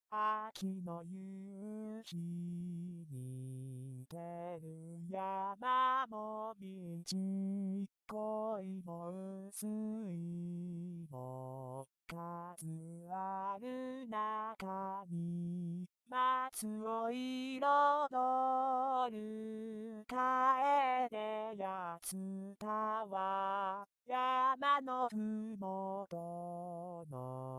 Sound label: Power A2; Meiko